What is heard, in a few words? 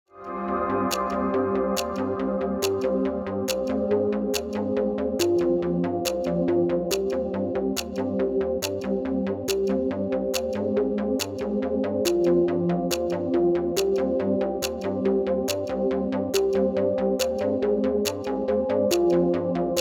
Synthwave Trap